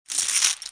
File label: income Pot